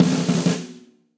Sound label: Snare Press Studio Basic; Snare Articulation; Drum Hits; Session Drums Studio; Standard Hits